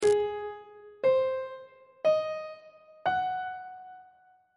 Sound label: b flat aeolian 7th chord 7 is a flat dominant 7th on treble clef